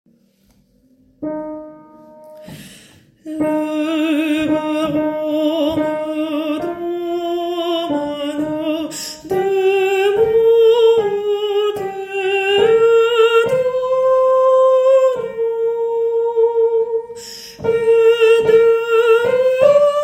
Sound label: soprano